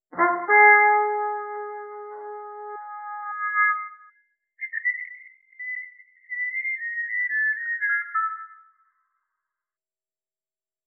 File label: classical